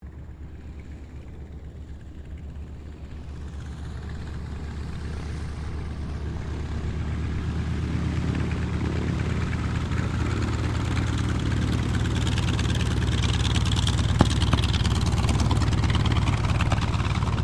AIRCRAFT T-28D TROJAN TAXI; Airplanes; Vehicles

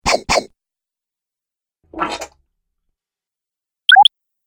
Videogame Fx; Sound Pack 8; Video Game Sound Effects

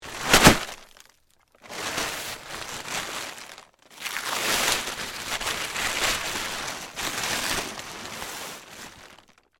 Horror, Body, Fall, On, Plastic, Tarp; Wrap, Corpse; Blood And Guts; BlastWave

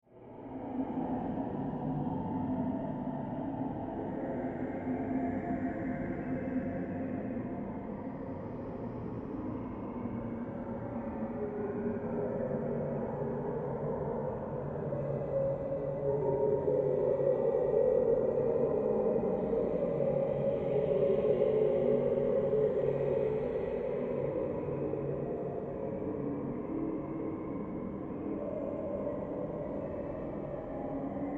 Horror Enchanted Forest Distant Birds Ambience; Scary Soundscape Sound Effects; SFX Bible 6

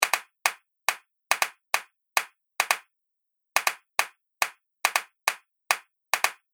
Hand Clap Groove Loop; Percussive And Rhythmic; Seamless loop

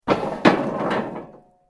metal rods move

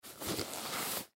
Gas Mask Take Off; Military; Breathing; Human; Warfare

